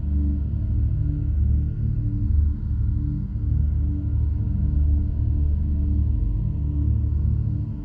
Camp, ambience

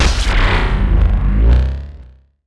laboring breath

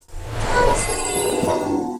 RECRUIT RECALL

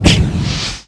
fire piece

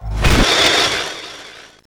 war attack; NECROMETA; Mob

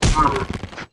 PEACE DAMAGE; BLAZEBLOCK; Mob

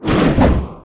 Attack; Host; Mob

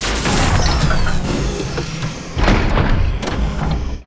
Die, Mob; Kwelersokum

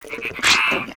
VOLCANIC DEMOLIS WAR ATTACK

